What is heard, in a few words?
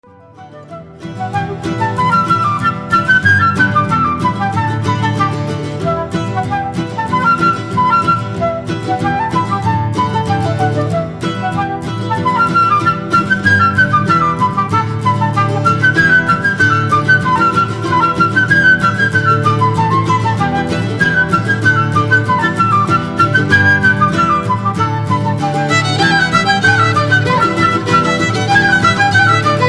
Irish Band, Irish Reels; San Diego; Celtic